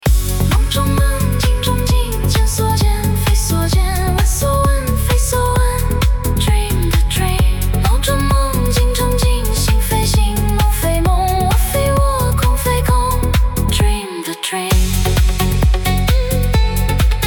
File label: Techno Remix